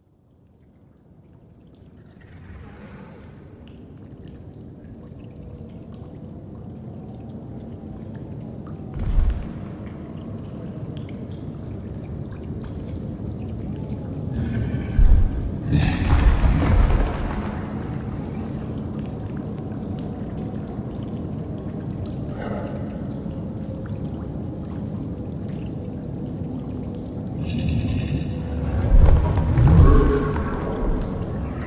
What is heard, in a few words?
amb cave; ambiance; environment track; caves